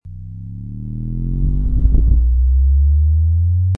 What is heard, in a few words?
KH120A 1000 THD 50cm 95dBc; Lautsprecher